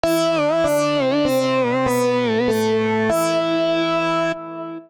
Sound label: Stompbox Pluck A minor 80 bpm; MIDI Clips; Melodic; Ableton; Factory Packs; Chop and Swing